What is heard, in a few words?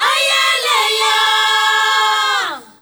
ZULU CHR, FEM. CHOIR